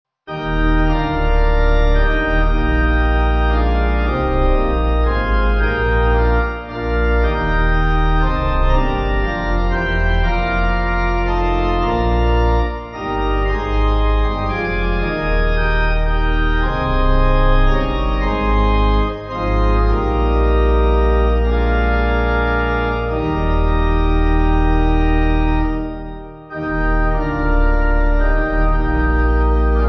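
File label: Pipe Organ, Music Backing Files for Churches; By Christ Redeemed; Memoria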